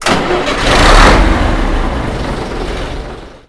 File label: v8 start loop; ratmobile; vehicles